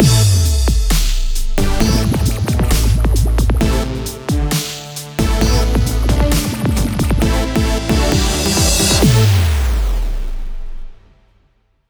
Stage Clear Theme

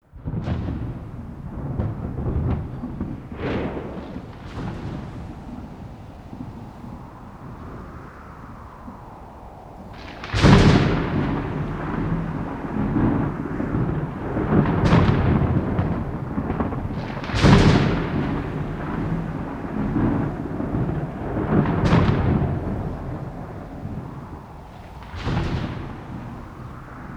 Thunderstorm

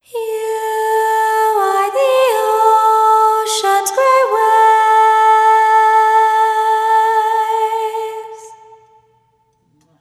Vocals; fire emblem fates if english; Opening Movie

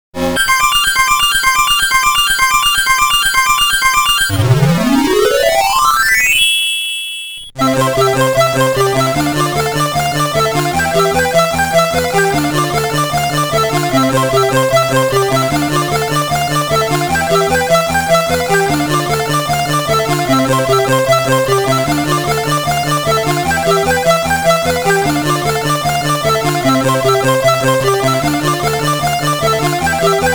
Extend, Bonus Music; Story of Bubble Bobble Vol. III; commodore 64

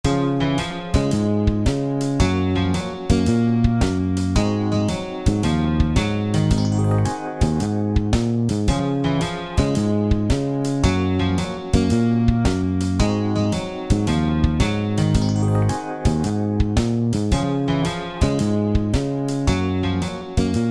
Bgm Snow; anpanman to asobo new aiueo kyoushitsu 3ds gamerip 2014

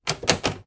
Locked Door

